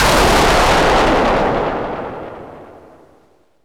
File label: BANGS; Megabass Remix! 2